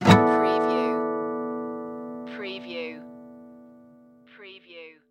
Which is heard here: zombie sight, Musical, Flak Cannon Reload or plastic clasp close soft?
Musical